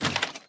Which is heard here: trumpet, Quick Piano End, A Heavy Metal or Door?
Door